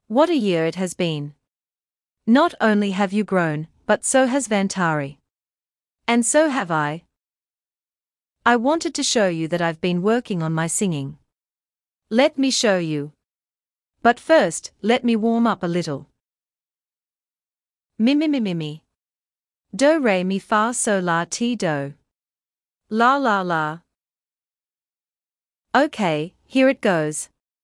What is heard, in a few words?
Singing